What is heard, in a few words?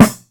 short steel snare drum A key, acoustic snare tuned to the A note